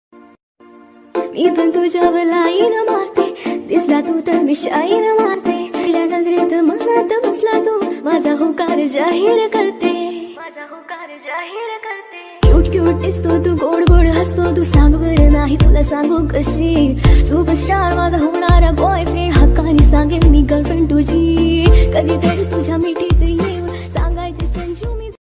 marathi ringtone

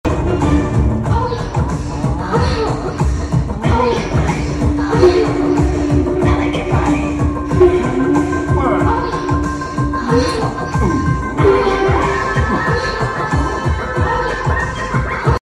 kannada ringtone bgm; ui; upendra; sunny leone

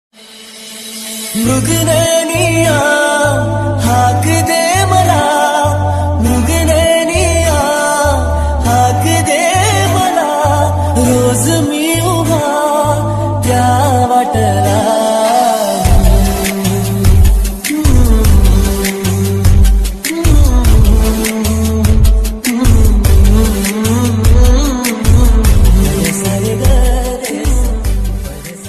ringtone

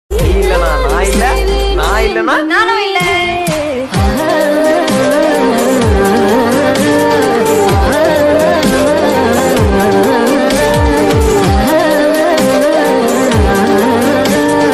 tamil song

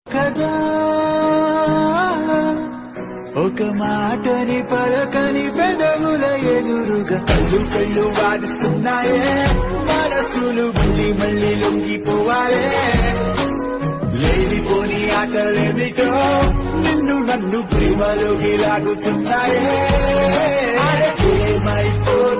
ringtone dj remix songs; Pitta Katha movie

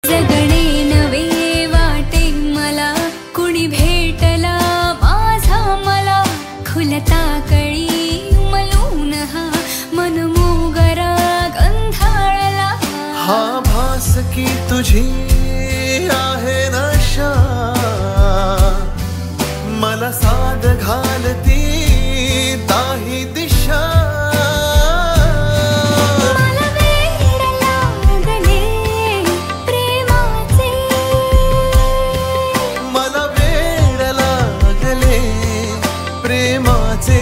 marathi song; timepass movie song

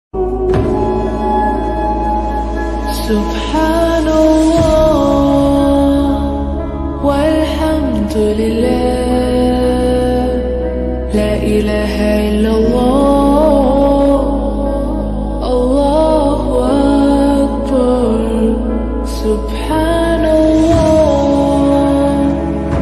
subhanallah walhamdulillah, muslim songs

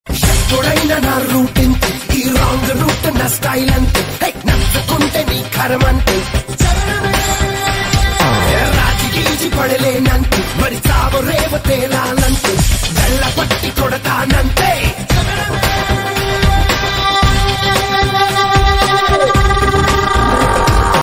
mass ringtone; Pokiri

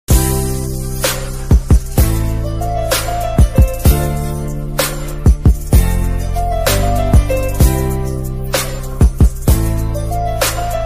ringtone song

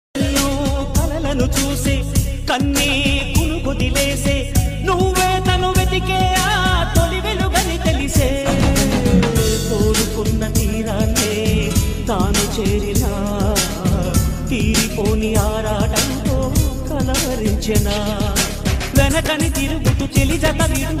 song ringtone bgm; pawan kalyan; tholiprema

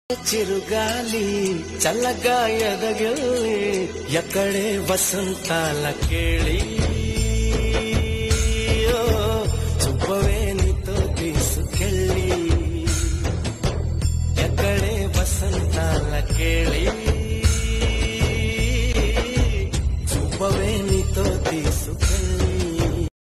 telugu song; okkadu movie; maheshbabu; lovestatus

